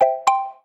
Jump, notifications; Coolpad; Tiptop-N2M

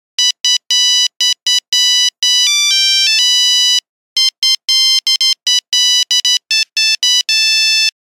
Jingle bells, Nokia Buzzer; Windows Phone apps

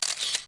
camera shutter; LG

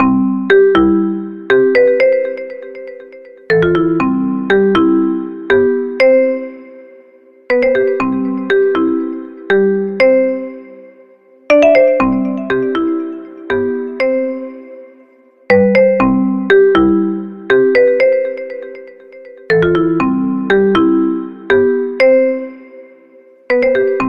Marimba, ringtones; Gome; U7

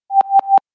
beaming start

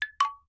Voice Record Finish; IUNI; ui; IUNI OS 3

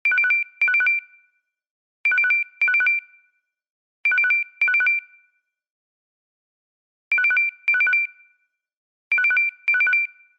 Simple Simple Bell, ringtones; LG; Velvet

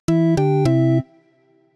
Jingle

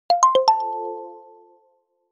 3RD PARTY NOTIFICATION; Nokia; HMD Global

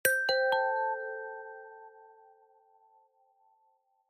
NOKIA ALERT Lumia Calendar

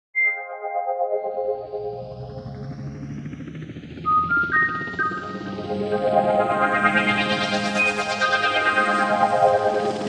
Clock, Nokia Tones; Shapes; Windows Phone apps

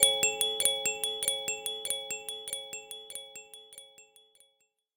Sunray Alarm, alarms; Yota Yotaphone 2

